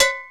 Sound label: Cowbell; Digital Sound Factory Xtreme Lead